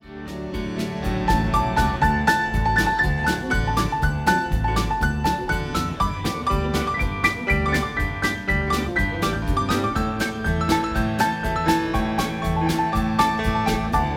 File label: Piano Solo